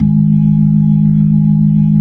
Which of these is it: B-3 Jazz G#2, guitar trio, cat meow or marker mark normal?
B-3 Jazz G#2